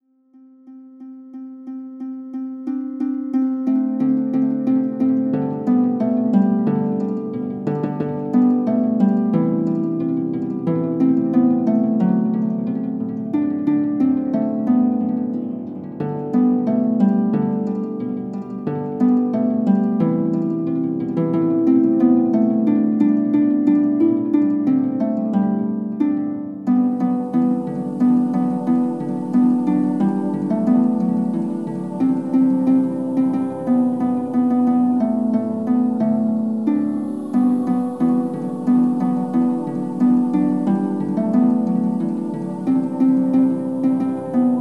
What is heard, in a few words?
Instrumental Jingle Bells